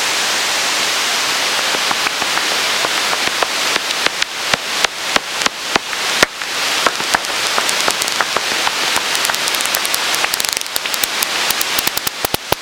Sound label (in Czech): netopyr rezavy